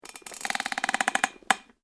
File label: METAL CREAK; Materials